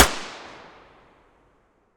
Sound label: muzzle p220 mono distant, weapons